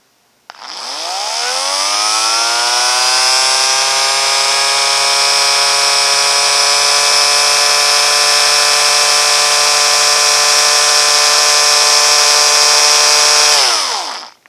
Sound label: Gearhead Motor Low Rpm